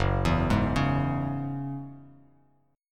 F sharp 7th Suspended 2nd F sharp down Bass Standard; F#7sus2 arpeggiated